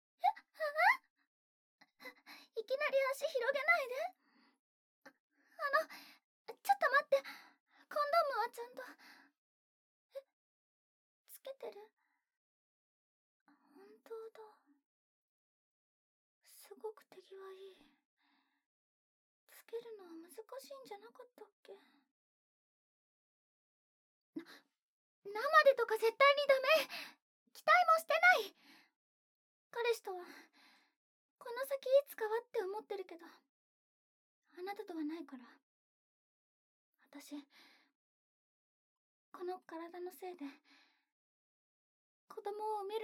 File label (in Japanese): 清楚オホ声